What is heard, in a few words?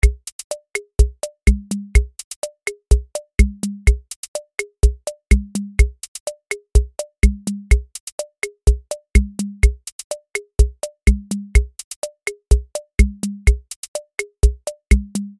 rumba; tiny pops; superwave